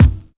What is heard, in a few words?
original kick drum single hit b key